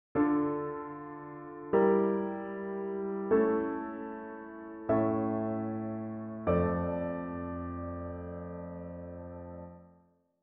harmonic error detection; ear training

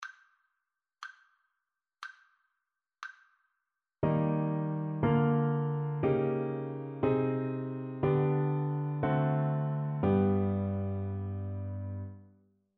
harmonic dictation; eartraining

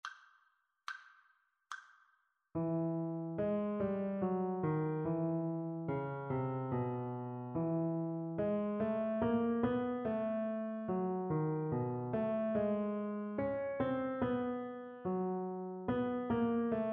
melodic dictation; eartraining

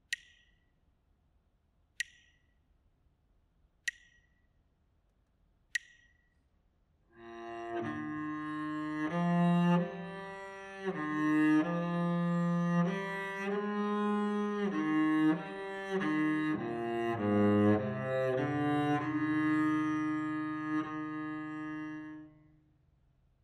melodic error detection; ear training

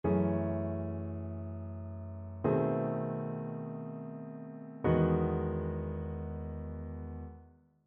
ii V I progressions; ear training

